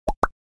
Facebook Like Sound Effect

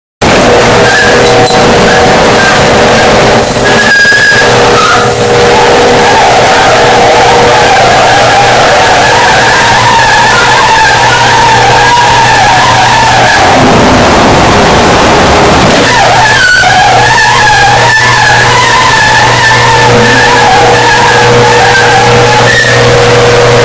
creaky garage door earrape; made with Voicemod

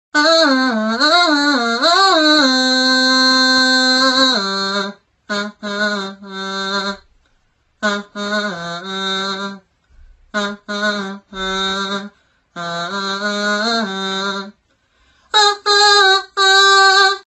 mosquito singing; meme sound effect

